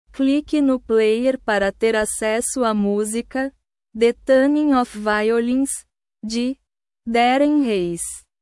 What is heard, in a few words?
the tuning of violins